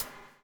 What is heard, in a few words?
BUBL, BUBBLE; Spectrasonics - Backbeat